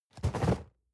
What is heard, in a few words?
cardboard box medium fall low soft; objekte